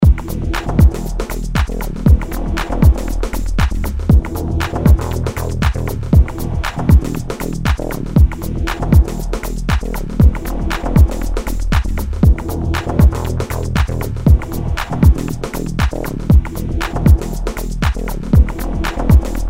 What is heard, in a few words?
bassline